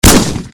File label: Sawnoff shotgun